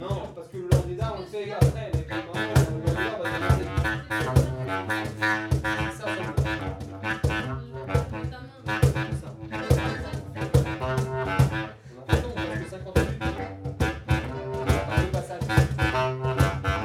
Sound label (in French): cercle circassien ryhtme